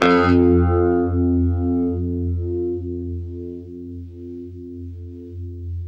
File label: UNIVIB F, Guitar; East West; Steve Stevens Guitar Samples Collection